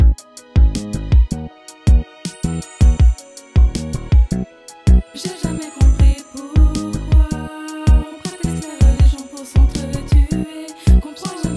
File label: beat